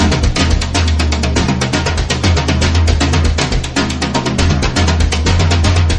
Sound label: drums; horde